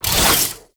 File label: Whoosh, weapons